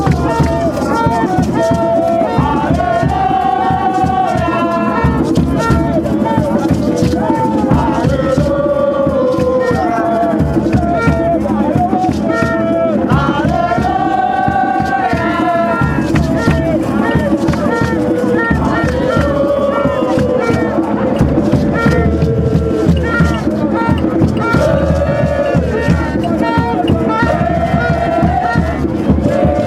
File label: chant, fan chants; ghana